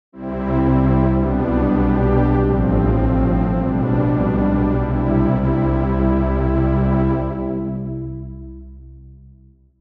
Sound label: Matrix 12 bulbous strings; opx pro3